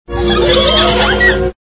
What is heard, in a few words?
munchkin hee hee; wizard of oz